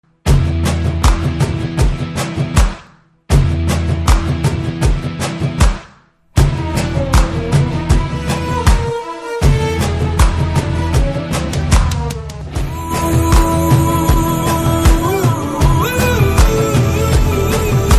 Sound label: telugu sad tone